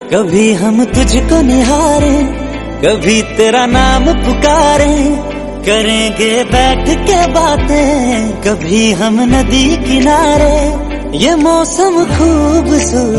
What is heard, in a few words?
hindi